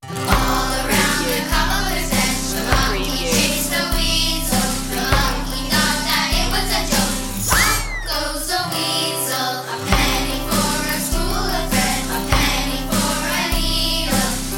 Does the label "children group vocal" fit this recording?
Yes